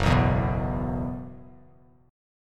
Gb 7th Suspended 4th Gb down Bass Standard, chord; Gb7sus4; strummed; JGuitar

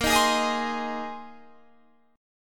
C Minor 7th Sharp 5th Bb

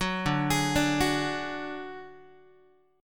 D Major Flat 5th D Guitar, chord; arpeggiated; JGuitar

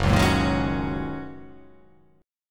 Gb 6th Add 9th Gb down; strummed; Gb6add9; JGuitar

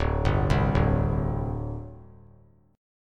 D 7th Suspended 4th, down; arpeggiated; JGuitar

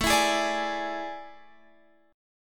A Major 7th Suspended 4th, Guitar, Open F; AM7sus4; strummed; x,16,16,15,17,15

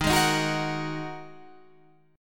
A sharp 7th Suspended 4th Sharp 5th D sharp

